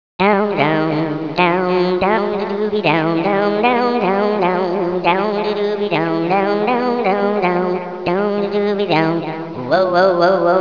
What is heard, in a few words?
sing; quake2; chaos